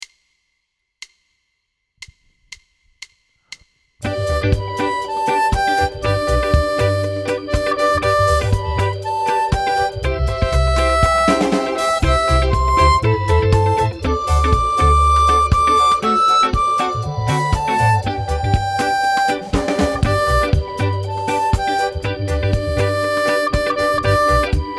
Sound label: 120 harp; Jingle Bells